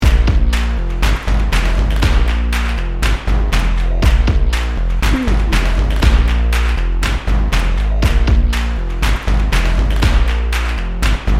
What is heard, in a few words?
funk junkie dnb